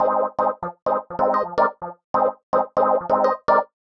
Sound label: 126 F MUSIC; funky; Loopmasters; Funky Underground Vol 2